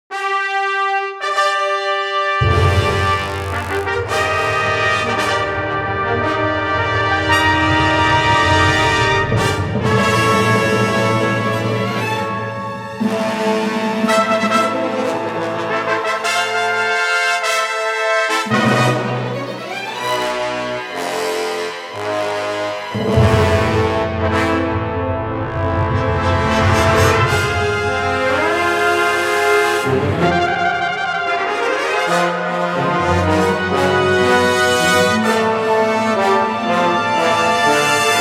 Complete brass

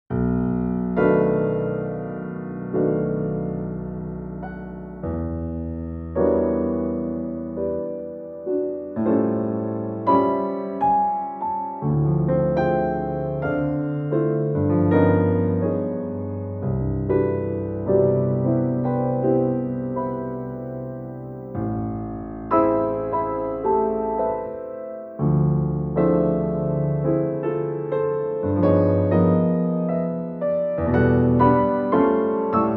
Prelude Improv

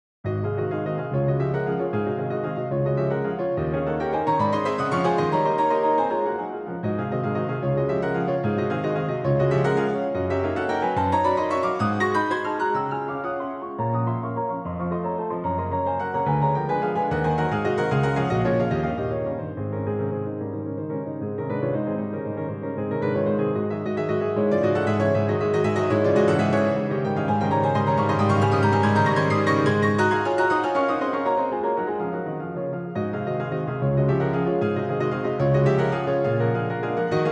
Etude in Db major; Etude Op. 25 No. 8; Sixths

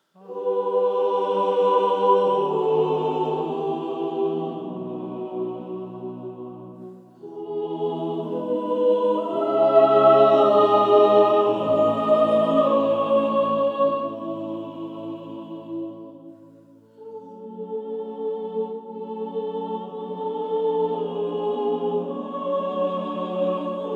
Choral

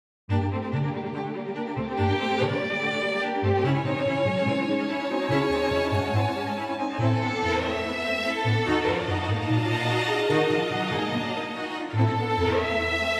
Complete Strings

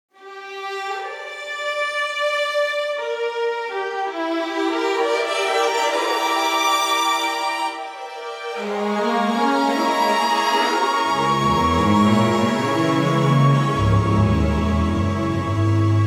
strings; Dimension

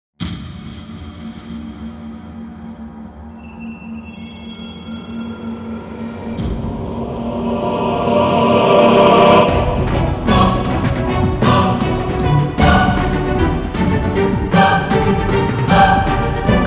Epic Wake

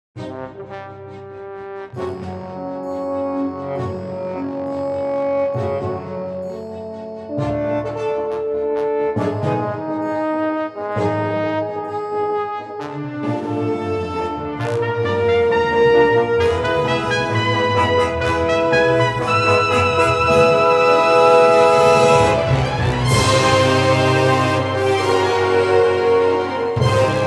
Brass

